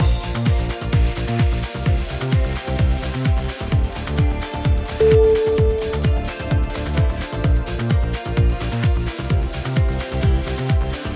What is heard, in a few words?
trance universe